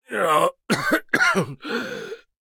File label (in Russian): Кашель; Маккри